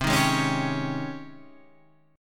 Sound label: G sharp Major 11th C